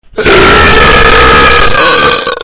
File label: buuuuuuuurp